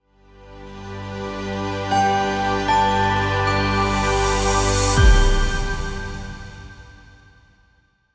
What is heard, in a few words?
Sting Logo